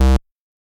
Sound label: bass